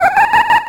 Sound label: penguin